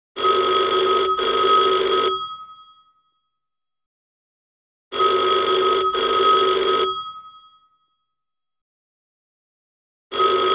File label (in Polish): telefon